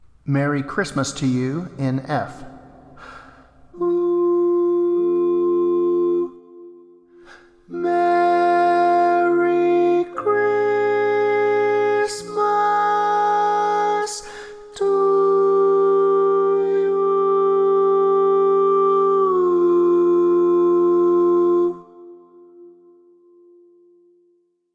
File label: Lead, barbershop